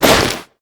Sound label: bag shift; tressa